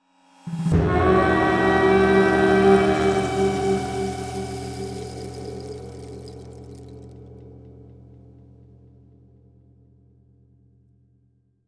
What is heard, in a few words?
Open Gate WARHORN; GRINDSTONE